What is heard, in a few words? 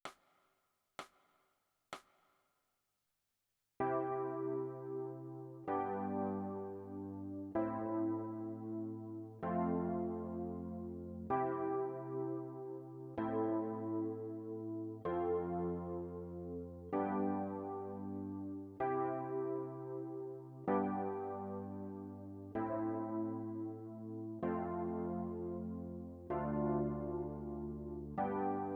E Piano